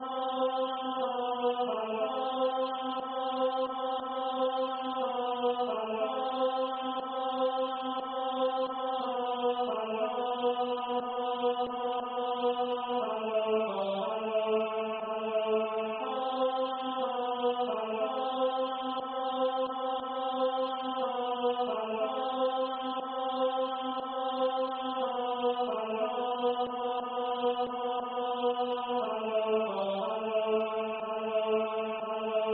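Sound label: B flat Bass; Mentre il silenzio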